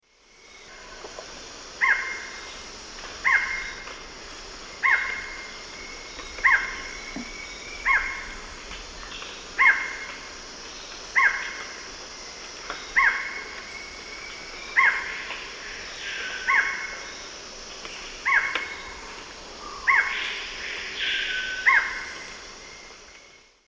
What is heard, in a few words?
Micrastur ruficollis, song; S. America; Eastern Lowlands